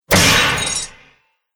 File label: Fantasy Enchanted Blade Strike, sword